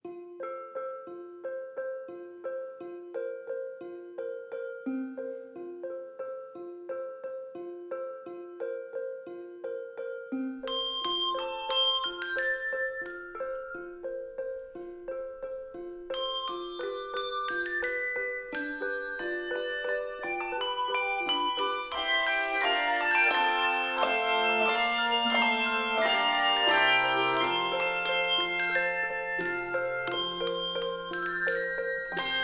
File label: Handbells 3-5 Octave